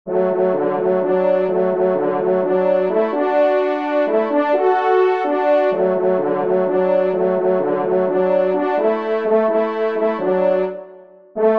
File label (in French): Aux Accents du Cor, trompe; 2e Trompe; Viney; Auteur : Victor Viney